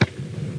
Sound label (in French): crabe; Emme; Arc En Ciel Et Le Lagon Merveilleux; French Version